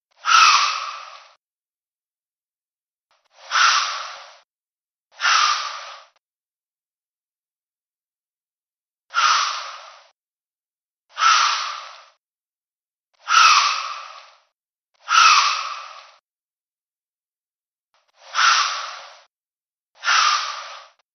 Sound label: Buck Snort, Deer; ORION